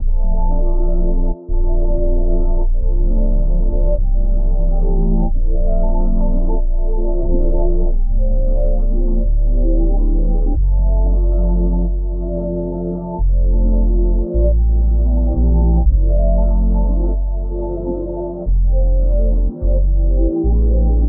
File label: 91 melodic stack Fmin